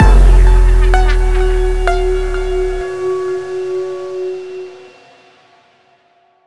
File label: SFX GAMEOVER; ekstase; macos switch windows gamerip 2020; SFX GAMEOVER MASTER